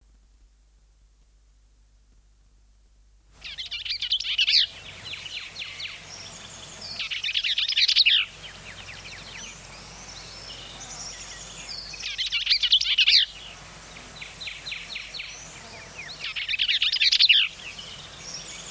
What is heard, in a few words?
bells vireo, songbirds